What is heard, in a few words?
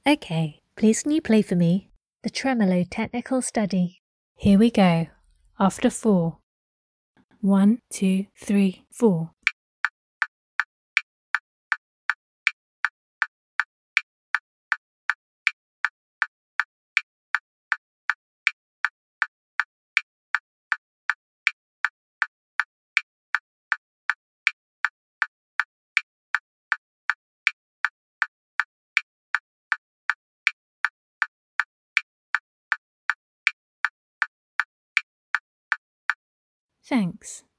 Tremolo picking